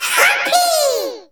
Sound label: SE GAME BREAK; maimai